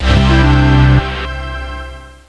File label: SUCCESS; mac